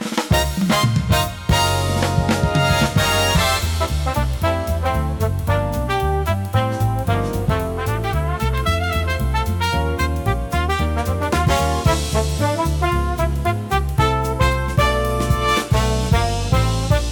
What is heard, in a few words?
rpg victory; tillys-quest